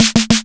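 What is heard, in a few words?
snare, drive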